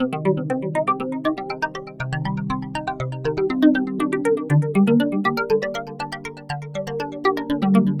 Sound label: Phase Pluck, Arpeggios & Sequences; Dr. Fink's Funk Factory